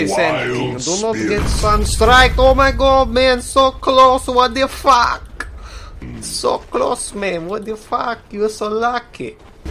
sunstrike

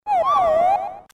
weird birds

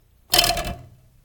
ruler go brrrr

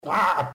aaag

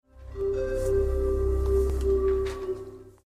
flute hit

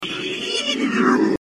heheheheree

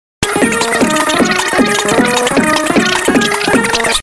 music mix

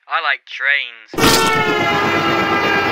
notification; i like trains

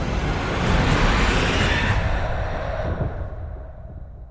booom; avenida brasil

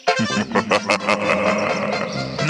creepy bowser laugh; hotel mario

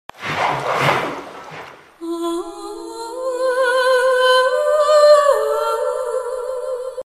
recall sound; pbe river spirit nami

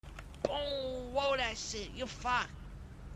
roll dice; mrchang

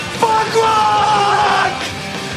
punk rock

